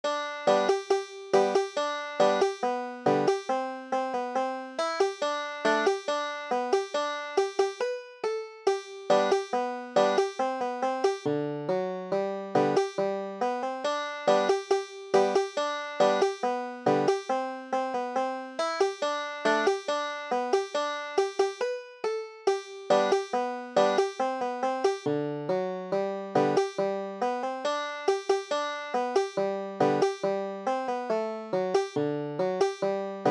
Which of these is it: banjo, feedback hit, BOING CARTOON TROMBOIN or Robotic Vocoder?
banjo